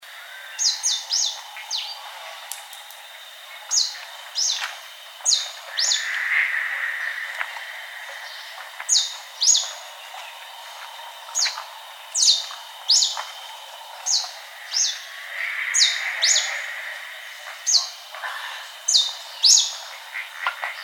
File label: santa marta brush finch